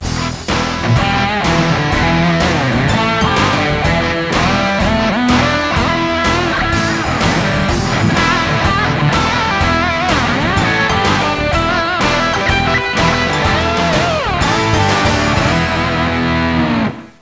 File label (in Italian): suonerie musica rock